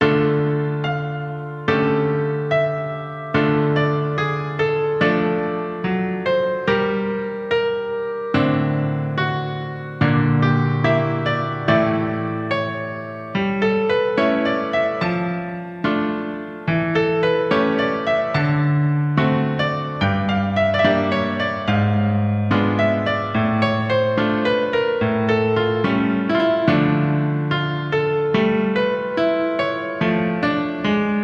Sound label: piano